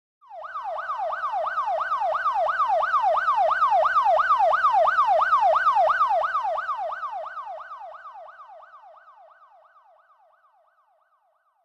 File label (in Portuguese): som de sirene